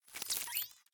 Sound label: minion pickup; smite; ahpuch skin10